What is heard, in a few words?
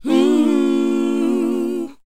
WHOA G#, Vocal R&B & Dance; ILIO; Vocal Planet